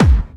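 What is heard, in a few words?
bass drum Punchy, kicks; VEC1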